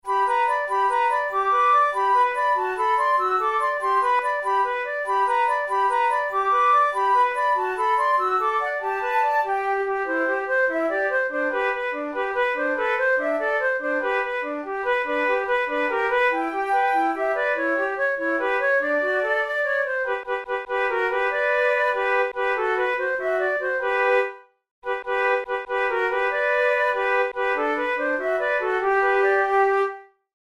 duet, flute

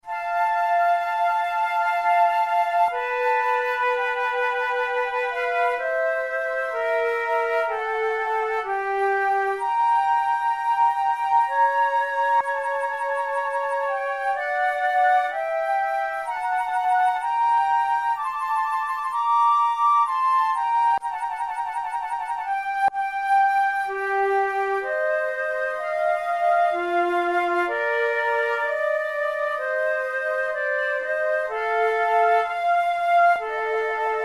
flute sonata adagio; mattheson

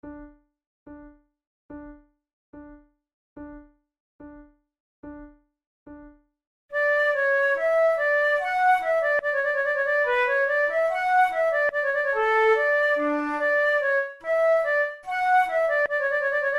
two flutes air

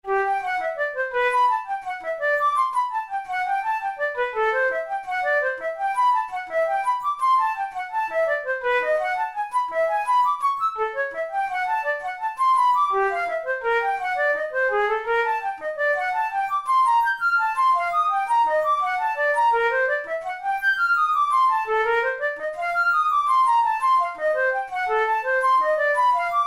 studies for flute solo; karg-elert

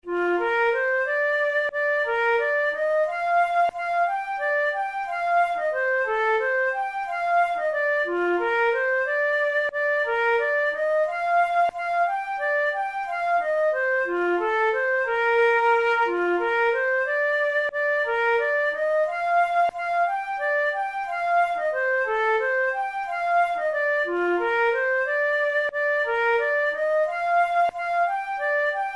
flute

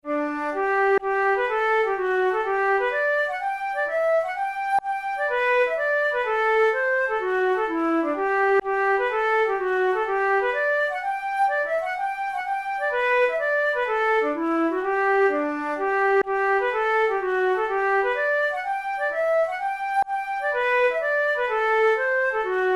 hornpipe

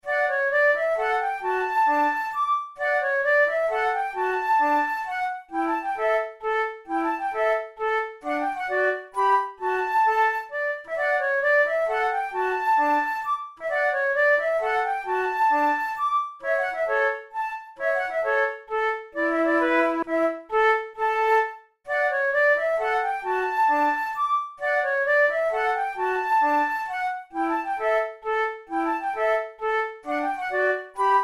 methode de flute; tulou

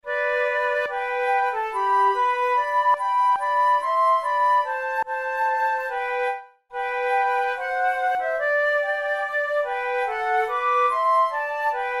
duet, flute

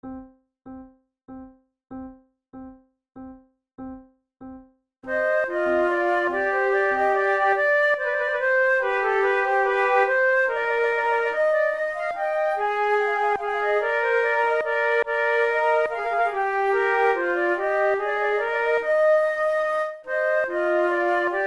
flute sonata menuet; mattheson

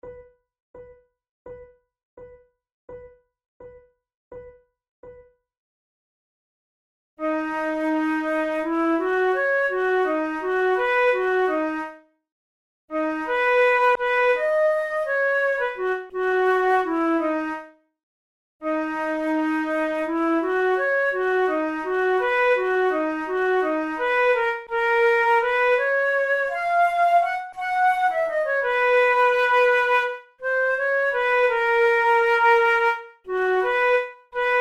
methode de flute; tulou

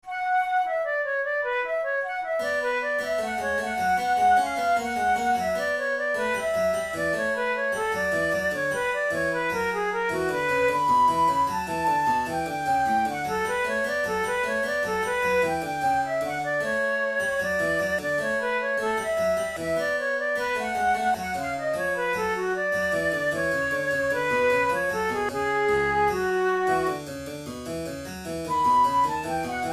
flute sonata in b minor alla breve

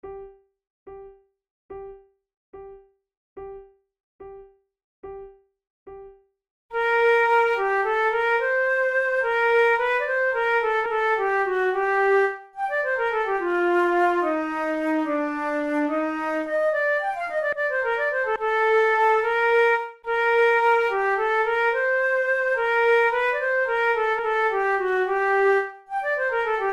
pour la flute duos andante; devienne; nouvelle methode

